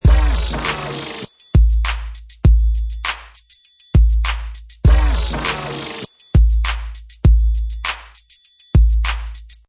Professional killer vinyl scratch on top of hip hop beat, 100 bpm; perfect for sampling, mixing, music production; Vinyl Scratch Slide 100 bpm with beat; Dj